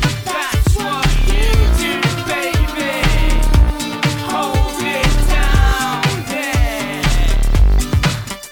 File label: Audio-Technica Clipping